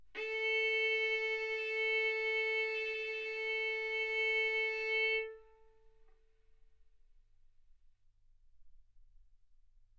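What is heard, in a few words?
Violin arco mf A string A4 B4 stereo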